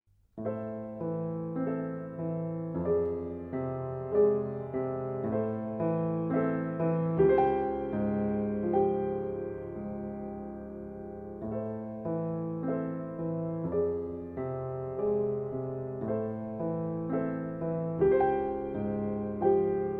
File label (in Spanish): improvisación